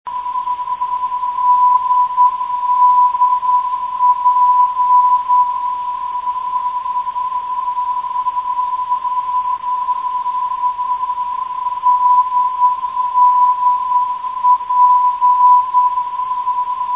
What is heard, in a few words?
ddp 391khz, NDB